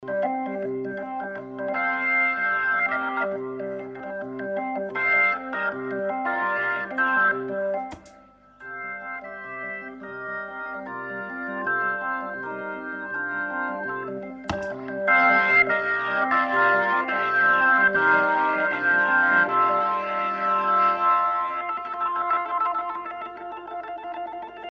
Rock Organ, Hammond T522; Only medium gain used, but a drawbar setting with 8' on max makes the overdrive kick. Lower manual used for left hand

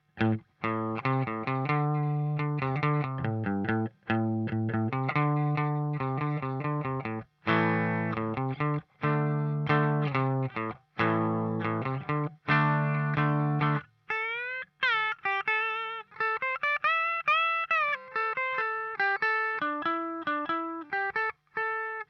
Clean Tele, played on a Squier Tele; ARIA/DIAMOND FUZZ(IBANEZ STANDARD FUZZ)

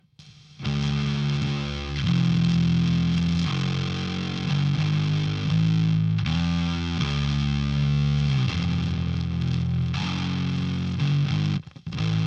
Fuzz Rick, played on a Rickenbacker 4001; ARIA/DIAMOND FUZZ(IBANEZ STANDARD FUZZ)

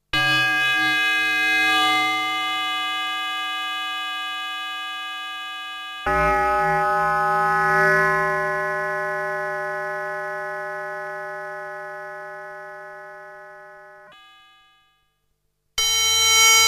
Tolling Bell; CS-30; When you have analog FM, you also have bell-like timbres. Here is an example of that.

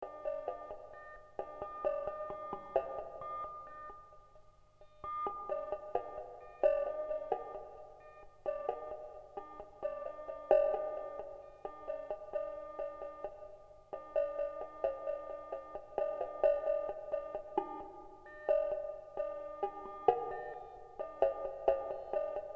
Tinny Drums; CS-30; This is a sound played totally hands free. The effect is a result of a chaotic interaction between sequencer stepping and LFO trigging.

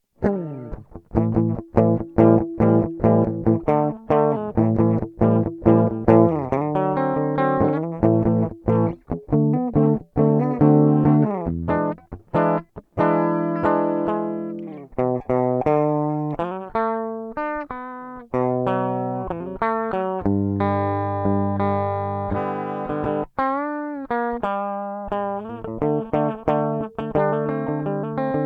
fuzz test, Vox fuzz sim

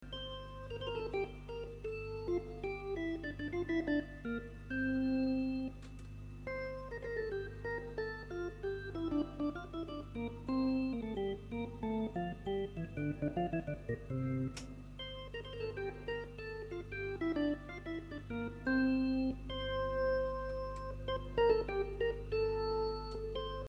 Percussive, Hammond T522; Different settings of the modified percussion section.

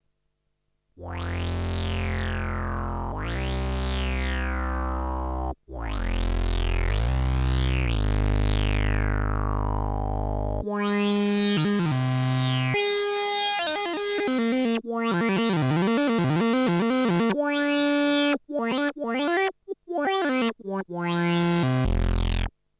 Square Filter Pan, CS-30; A nice classic type sound. Notice the nice bottom end of this sound

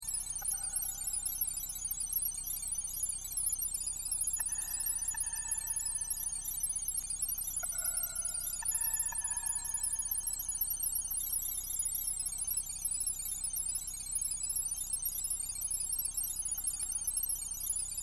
River Flow, CS-30

